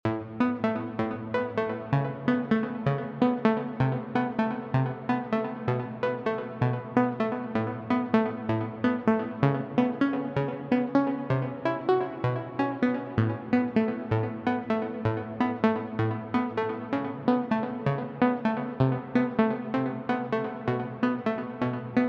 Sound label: pluck